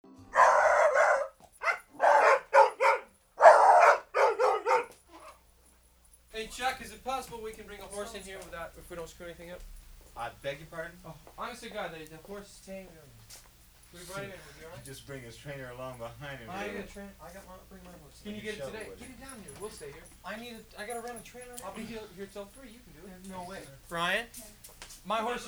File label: Dog Barking Session